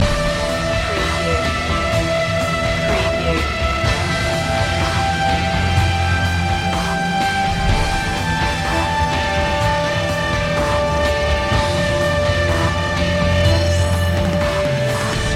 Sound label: impending doom loop